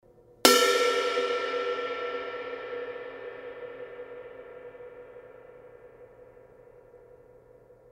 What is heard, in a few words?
Kerope, cymbal sound